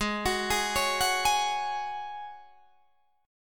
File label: G sharp 7th Suspended 4th G sharp, Guitar DADGAD